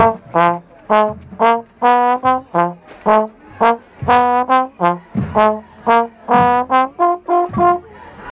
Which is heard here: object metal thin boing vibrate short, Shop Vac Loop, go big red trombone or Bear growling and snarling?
go big red trombone